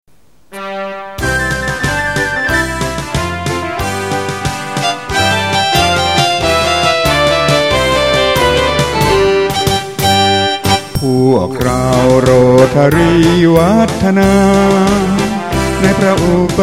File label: March Vocal Short